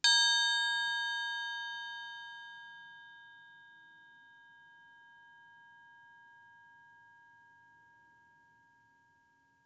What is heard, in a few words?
Triangle